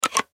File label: Switch Slide; Technology; Blastwave